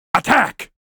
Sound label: Attack